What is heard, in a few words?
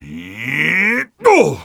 head exert; Sniper; taunt